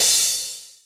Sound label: drum hit finish